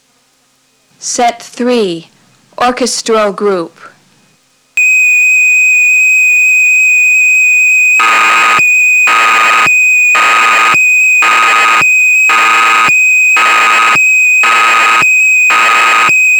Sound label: Orchestral Group, dx9